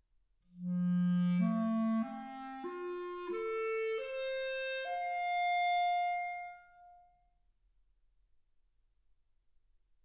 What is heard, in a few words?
clarinet